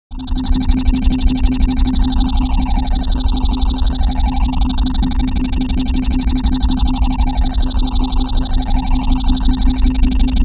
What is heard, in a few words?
Phasing Impulse Sequence; Bizarre Planet